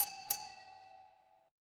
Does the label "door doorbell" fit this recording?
Yes